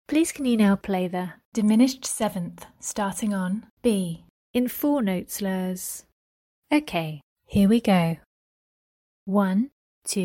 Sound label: dim 7th starting on B 4 note slurs